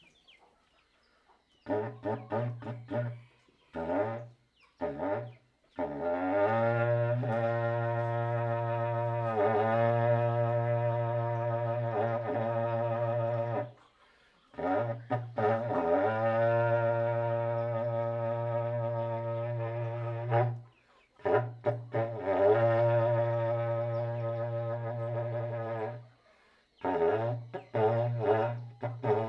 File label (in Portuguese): Berrante de boiada; Colecao Sonora do Cerrado